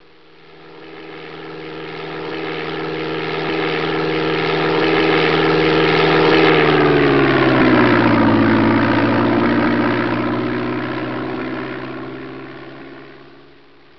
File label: biplane; tfc